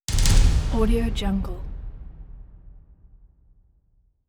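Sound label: deep dramatic drum roll; hollywoodedge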